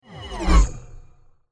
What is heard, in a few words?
Woosh dry; افکت صوتی